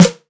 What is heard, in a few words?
sharp snare drum sample f key; snare-drum-samples